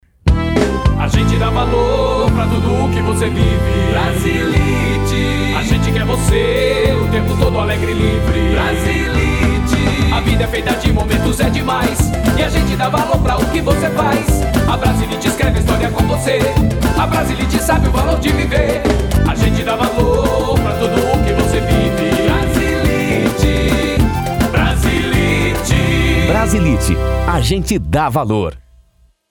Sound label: Jingle; Brasilit